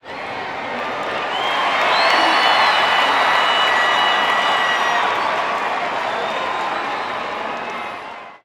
Baseball Crowd Cheer; Crowds

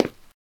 stone

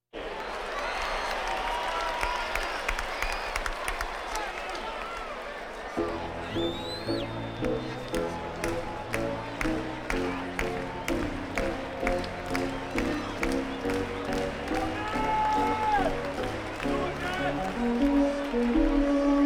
Crowds Race cars Baseball

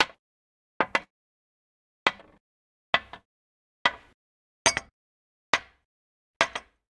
glass - put down on the glass table; NS Studios - ultra sound collection

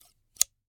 Scissors, snap, snip, shear, large, single

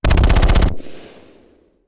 Machine Gun; Sound Ideas; Guns

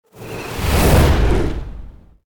Fire Whoosh Speedy; Hollywood Edge; sound designer's toolkit v2

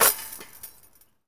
Street Ball Chain Swish; SFX Kit; Street Basketball

